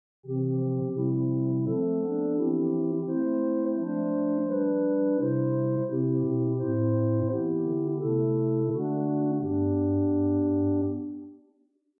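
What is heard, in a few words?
Great Hohlflute, organ; Prudhoe Methodist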